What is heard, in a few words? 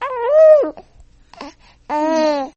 Nursery Baby Awake